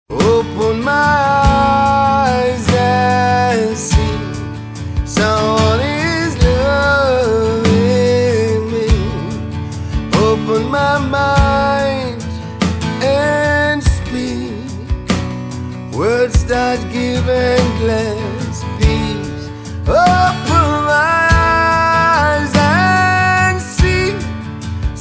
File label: Acoustic Track